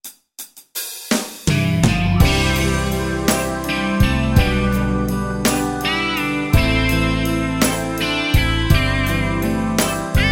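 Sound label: love slow rock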